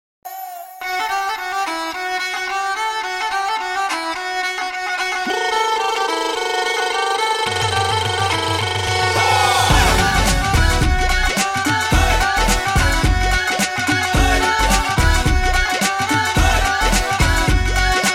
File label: Sarangi